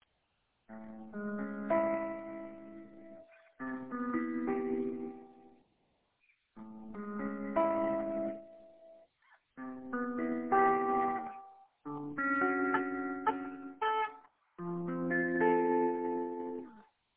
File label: ambient guitar with jazzy chords